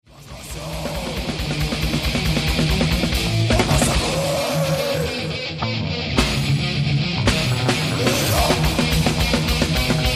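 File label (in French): guitare électrique riff; une suit de riffs de ma composition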